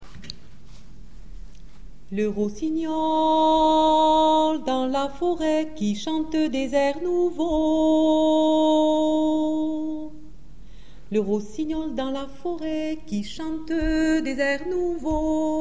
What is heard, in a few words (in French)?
Rossignol beziers